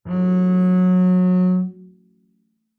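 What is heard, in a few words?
Bass arco fortissimo sul D F3, Double Bass; MIS Pitches - 2014; Strings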